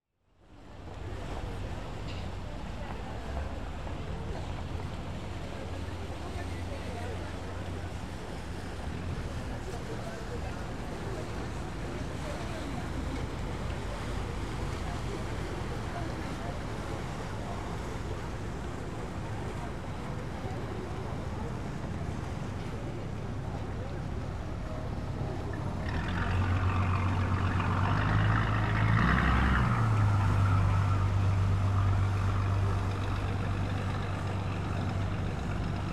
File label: Italy, Busy Canal With Footsteps; European Soundscapes; BBC Sound Effects Library